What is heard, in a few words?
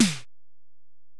Tom, Alesis DM-PRO, Drum Machines, Toms